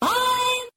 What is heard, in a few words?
"i'm" SUNG; ooh ahh